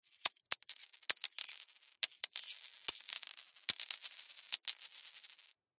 Coins Count Drop, Coins Fall From Hand Slide Count Multiple; Money; Foley; Blastwave